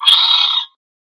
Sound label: Animal Speaker Call